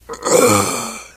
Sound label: death scream, biker, survivor voice